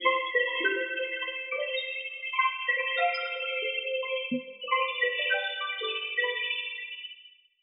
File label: glitch C maj; fx; bespoke - Floral v.1